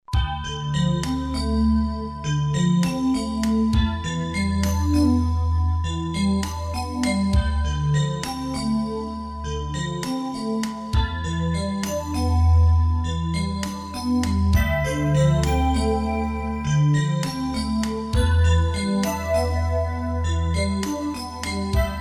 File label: MUSIC BOX